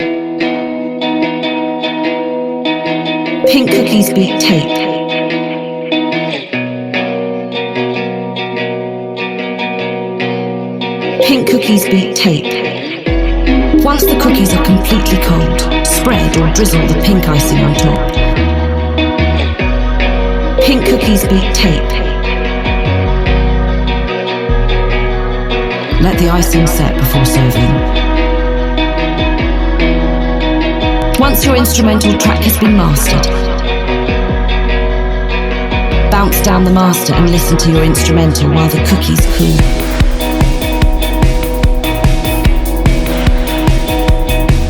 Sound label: Breakbeat